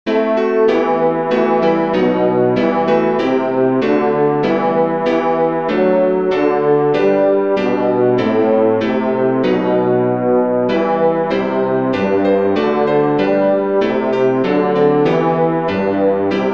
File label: erhalt the glory of these forty days bass; ERHALT UNS HERR Gelistliche Lieder, 1543; NOT the Bach harmony